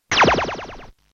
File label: 8k 8nonPCM effect